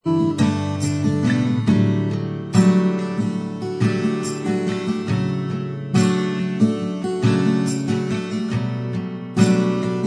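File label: acoustic version instrumental track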